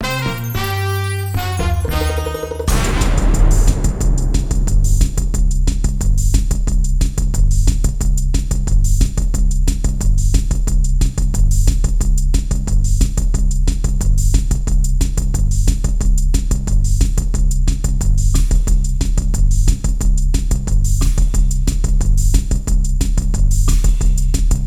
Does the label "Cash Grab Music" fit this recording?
Yes